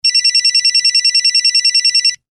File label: Cell Phone Ring; Alcatel OT-903; ringtones